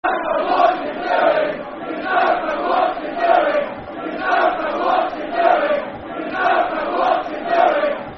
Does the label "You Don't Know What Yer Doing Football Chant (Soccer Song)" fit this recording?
Yes